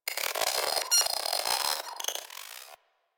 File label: Venus Corpus Data; Ambience; Warframe; Venus Open World; Venus Landscape